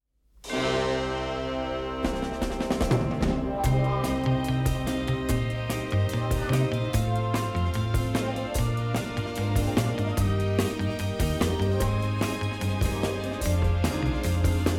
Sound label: End Credit Instrumental; Hammersmith Is Out